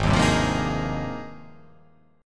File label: Ab Major 13th, AbM13 chord; strummed; JGuitar